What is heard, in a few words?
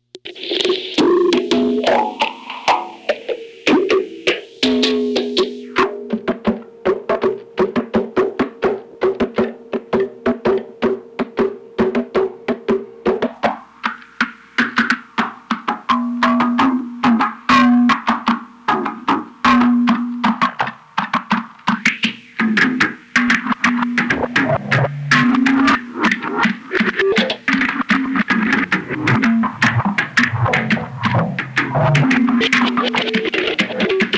drum; ciat-lonbarde; cocolase